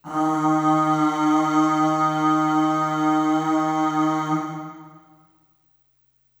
Aah Long D#2 Short Reverb, Harmonic Building Blocks